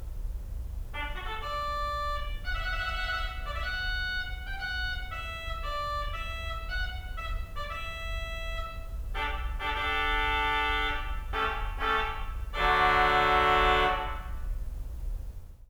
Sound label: trumpet